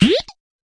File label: fx drum, Electro FX